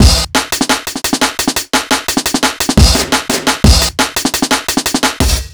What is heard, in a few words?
E Beat, Electronic Beats